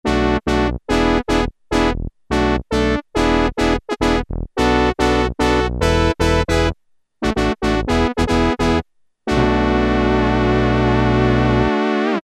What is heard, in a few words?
Brass, Korg PolySix; Factory Presets Bank A